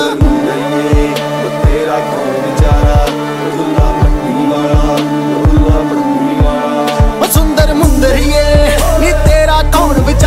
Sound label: Folk Trap